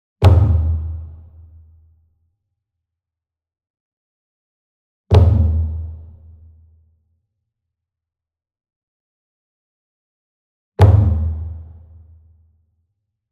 SINGLE DRUM Kodo Flam Soft Beater Medium, Cinematic Strikes; boom library; Cinematic Strikes Construction Kit